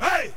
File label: CHANTS; BIG WHITE CHANTS